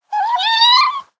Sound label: Kitten Meow; SES Animals SFX